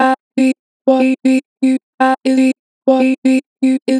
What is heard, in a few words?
Chop - 2 bar vib, Natural Vibrato, Vocals; Harmor Vocals